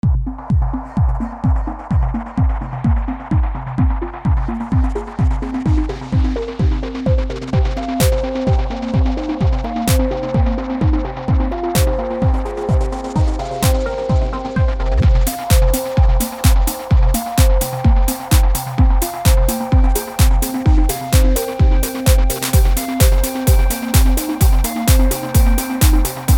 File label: reviver 128bpm; weeklybeats 2026